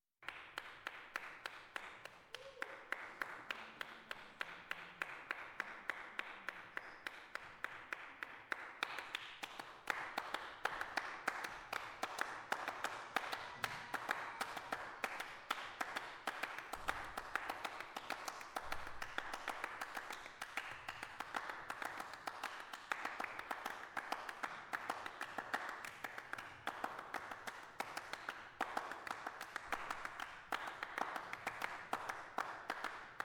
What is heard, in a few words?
Clapping Music